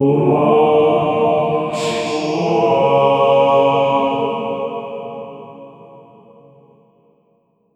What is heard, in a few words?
intimidating demonic choi, choir